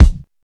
round kick drum sound b key, steel kick drum tuned to the B note